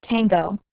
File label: tango